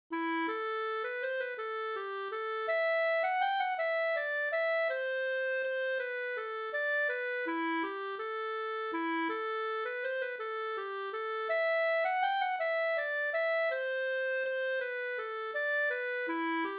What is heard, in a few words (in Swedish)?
langdans; fr Vastra Torsas Da